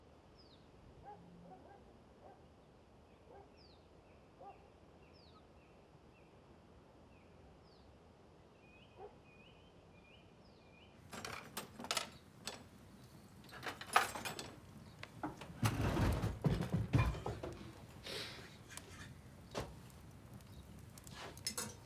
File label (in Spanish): cocina tuesta pan; amador; o que arde; oliver laxe